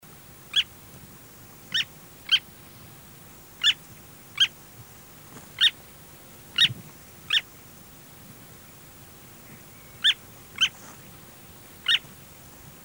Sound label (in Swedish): Fjallabb